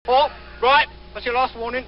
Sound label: warning